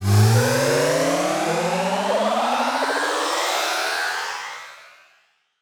charge; upgrade

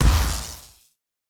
hit shock medium; CrossCode; battle

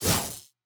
close combat shock sweep; CrossCode; battle

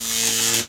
spark; The Swapper

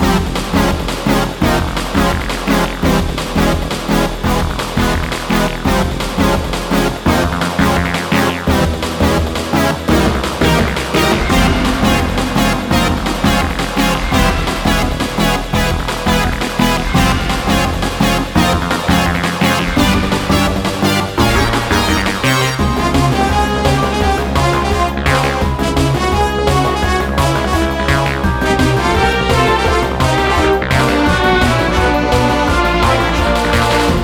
Boss battle, bgm; CrossCode

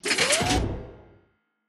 robot crouch; boss

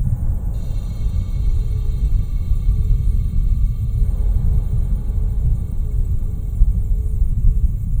serious ambient; CrossCode; background

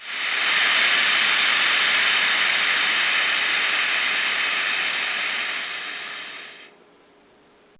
air leak; The Swapper; death

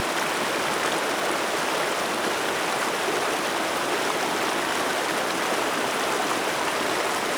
river strong; CrossCode; background

